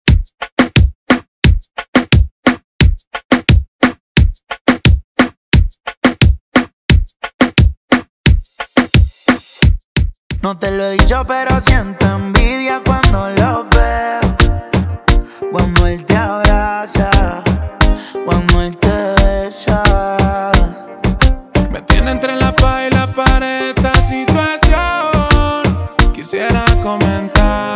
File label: remix bpm 88